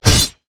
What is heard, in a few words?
pirate basic attack; LoL; gangplank